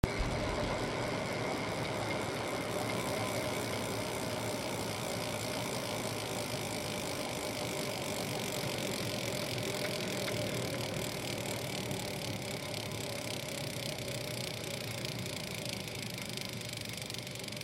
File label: bicycle whirl